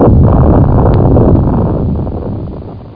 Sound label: nuke; tykes; Amiga 8-bit Sampled Voice